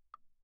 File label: granite block, pp; Percussion; hand percussion; graniteblocks